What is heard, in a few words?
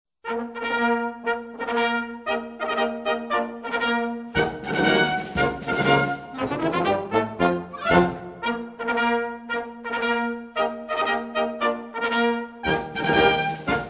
march